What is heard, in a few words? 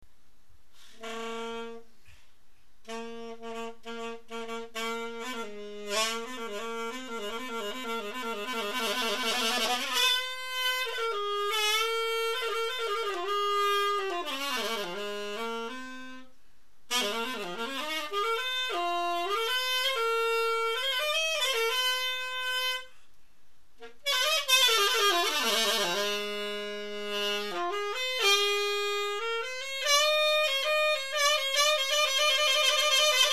fast slow, sax